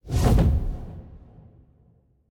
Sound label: wing; smite; npc; grkconqs8 titan chaos; s8chaostitan